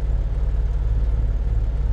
idle, vehicles

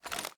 ar reload empty start; gmod; viper

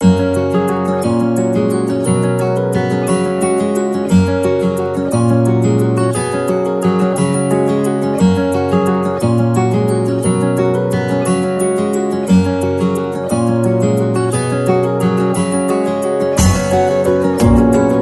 bgm

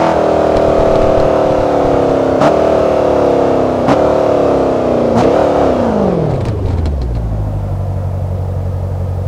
throttle off, vehicles; 17camaro

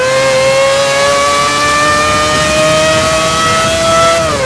third, vehicles; gmod; tdmcars; furai

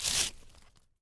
plant cut; gmod

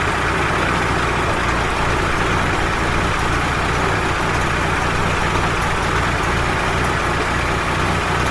idle loop, vehicles

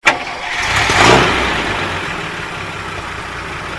start, vehicles

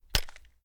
reload fast end

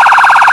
warning

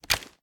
reload xmag end; gmod

